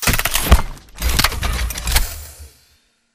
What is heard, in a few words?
stand reload; Titanfall 2